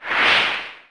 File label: pose